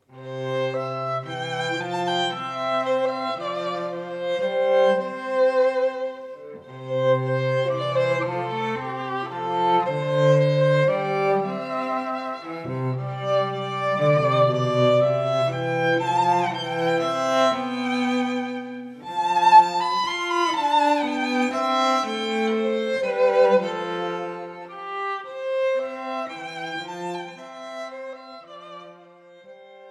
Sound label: traditional irish be thou my vision